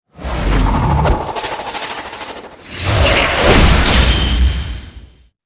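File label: Summon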